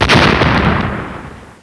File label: Sand Box Explode; 1997 MMX LAN PACK (win 95); Army Men